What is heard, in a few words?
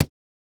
reel Bounce; netent